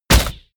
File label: crossbow shot